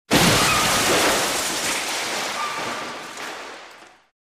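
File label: Crash Metal Glass Reverse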